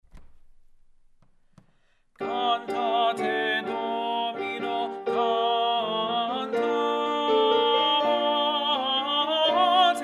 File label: Tenor; Cantate Domino, G. Pitoni